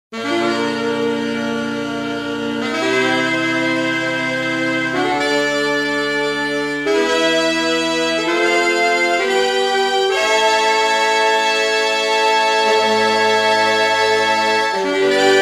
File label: octave sax